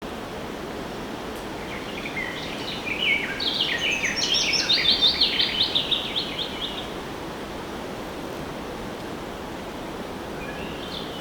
Wetland Forest Day Amb Loop; Midrath Island; Ambience

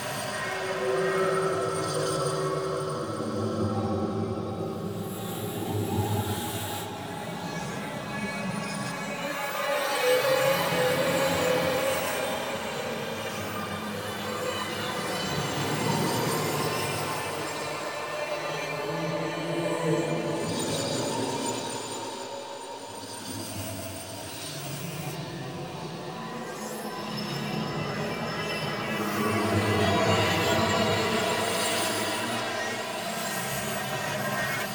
Amb Loop, Interior; Warframe; The New War; Zariman

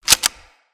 Release Gun Foley; Tutorial; Warframe

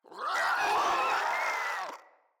Infested Crawler Death; Crawlers

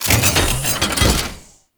Fortress Turret Retract; Ambience; Gameplay; Warframe; Grineer

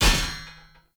Sphere Polearm Medium Parry Impact; Weapon Impacts; Player; Combat

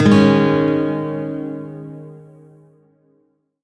Shawzin Yo Chord; Chord B; Music; Warframe; Lotus Shawzin

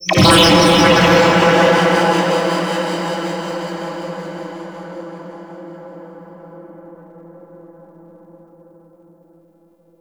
Ice Anti Freeze Generator Pulse; Warframe; Corpus

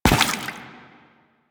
Step Water, Footsteps

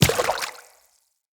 Infested Medium Run Water, Footsteps

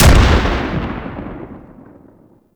Sniper Rifle Low Sweetener; Warframe; Weapons; Tenno

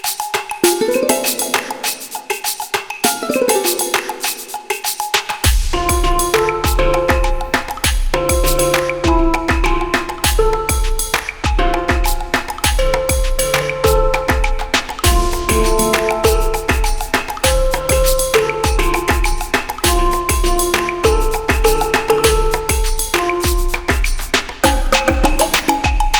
Beach Music; Dog Days

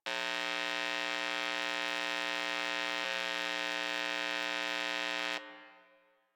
Electrical Box Hum Loop; Ambience; Props; Warframe; Nineteen Ninety Nine

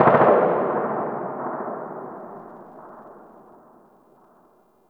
Distant Gunfire; PVP

